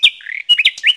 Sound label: message sound